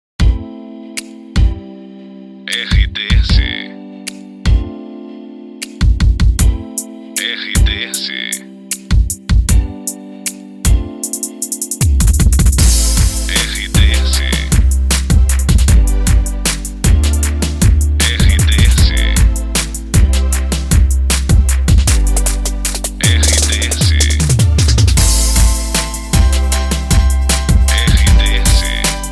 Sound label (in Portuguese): trilha para locução; Pacote Maximus FM de Rio Paranaíba/MG. Emissora com perfil Jovem